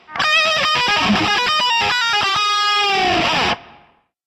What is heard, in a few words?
lick; music licks; brutal legend